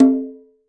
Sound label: Conga Lo Open; Roland BK-5; Percussion